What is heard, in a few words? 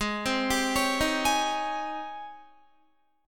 G sharp Major 11th G sharp, Guitar; JGuitar